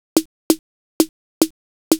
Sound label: Snare Loop, Drums, Dance Beats